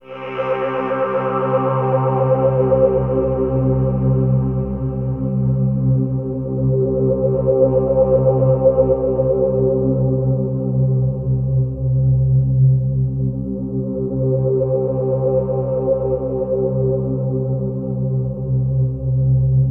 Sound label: Access Virus B; Synthworx